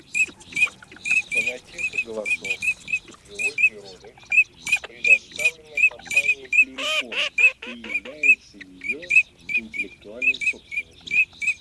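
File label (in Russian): Чирок-свистунок Alzavola, манок; plurifon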